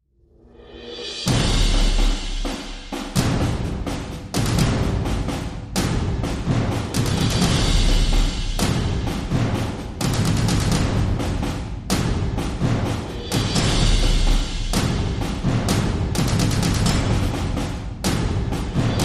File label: Drums Percussion